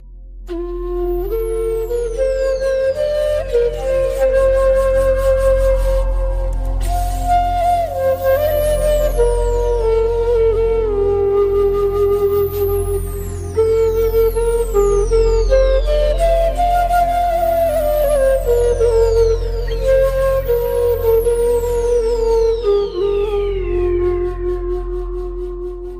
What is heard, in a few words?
Pak Sar Zameen Shad Bad Instrumental Ringtone